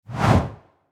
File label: Infographic data chart zoom in